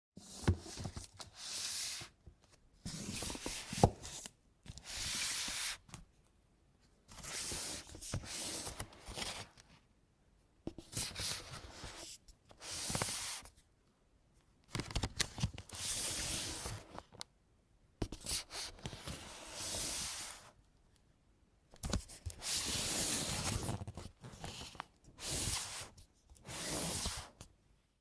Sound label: Bookshelf